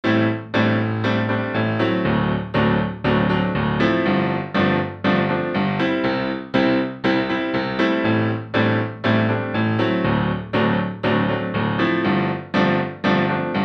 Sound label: pop; 1968 london